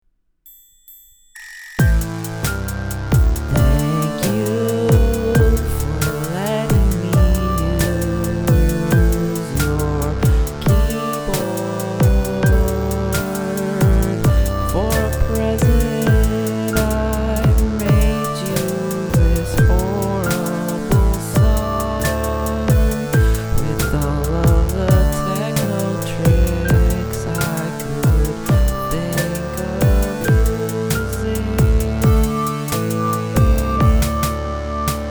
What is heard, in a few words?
synth noodling